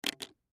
Drivers License Drop On Counter Top; Identification; Blastwave; Foley

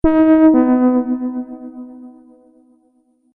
Multimedia Event Notification, beep sound effect

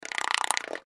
Gear Sputter Turn; Cartoon

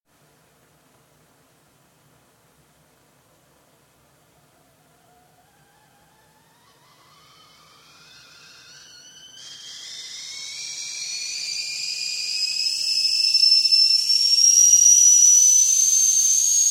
Whistling kettle coming to the boil; Kitchen (Sound Effects:Household); BBC

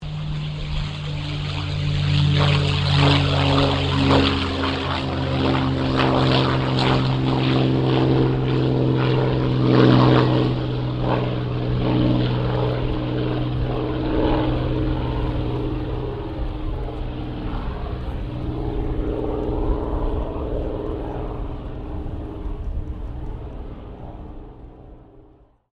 AIRCRAFT CA-18 MUSTANG TAKEOFF; P51 /CAC-18 Mustang; Airplane Sound Effects; Airplanes (Sound Effects:Vehicles)

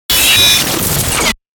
fast attack; Fast Attack Glitch Warp; 5.1 STEREO MIX; Warped; Blastwave

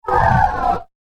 Gritty Flyby Whoosh; Andrew Fleming

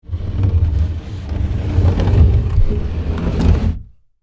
Scrape Slide Wood, On, Wood, Surface; Texture; Scrape Sound Effects; SoundEffects-BlastWave

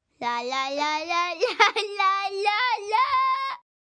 Lalala Giggle, Singing, 1 boy, practicing scale; VoiceBox

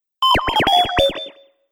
Droid Message Bleeps, robot sound effects; An Essential Sci-Fi Robot Sfx; Andrew Fleming

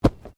Bodymovement Fall Light

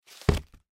Telephone Book Down, Telephone Book Set Down; Offices; Foley; Blastwave

